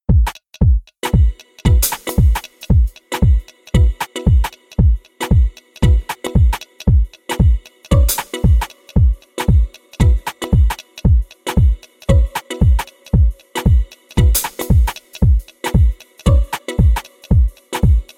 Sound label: Dub Mix